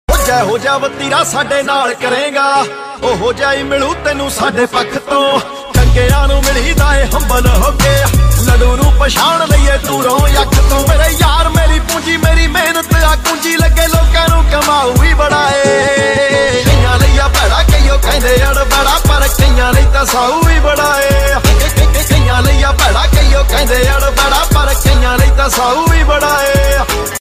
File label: Punjabi